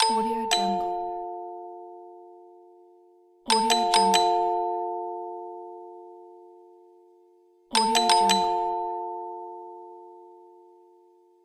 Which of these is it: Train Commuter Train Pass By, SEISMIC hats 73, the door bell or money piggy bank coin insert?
the door bell